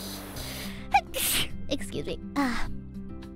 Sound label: Sneeze